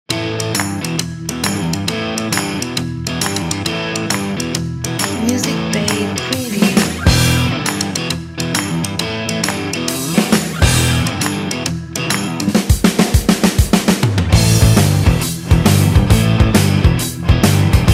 Vintage Rock

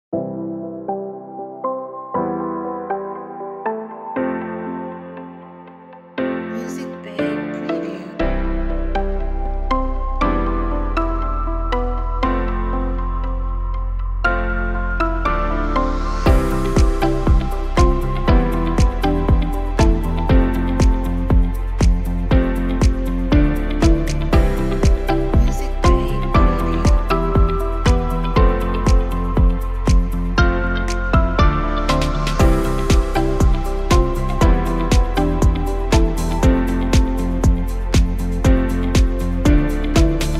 Inspiring Uplifting Motivational Corporate

